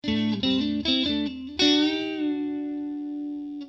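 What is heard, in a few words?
strat, guitar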